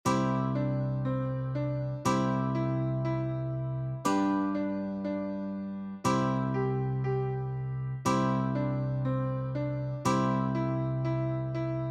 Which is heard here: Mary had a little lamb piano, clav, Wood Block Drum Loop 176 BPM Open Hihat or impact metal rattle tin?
Mary had a little lamb piano